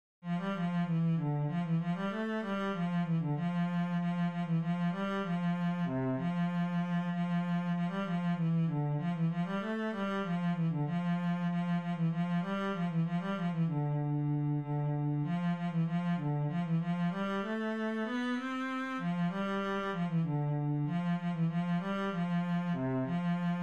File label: Estampie cello